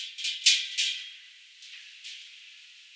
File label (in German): walzwerk; akustik